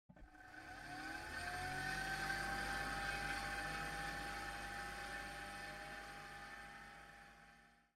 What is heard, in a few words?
atm working